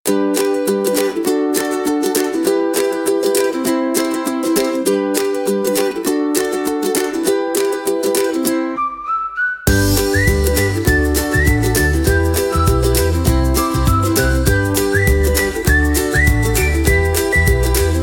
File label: carefree happy upbeat ukulele and whistling